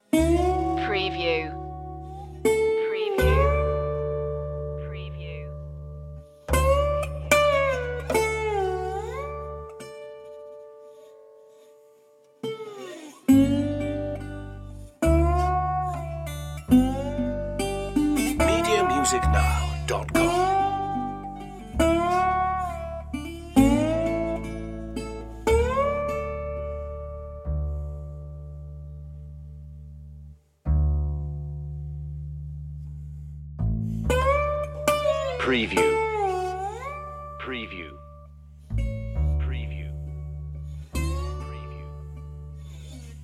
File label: western resonator guitar bass